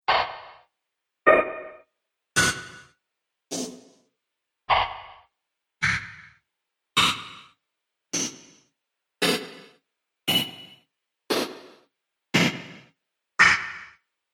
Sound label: Robotic Hits; Robot Sound Effects